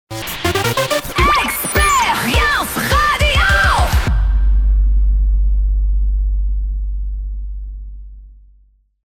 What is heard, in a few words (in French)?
Radio Jingle Chante Pop Rock